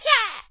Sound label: grunt; talking tom gold run gamerip 2016; Angela